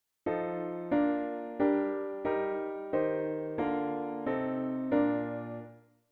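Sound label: diatonic inverted V7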